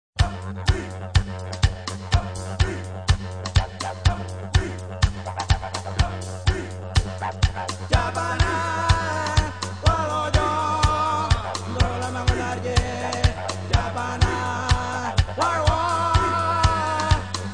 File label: Beat The Beat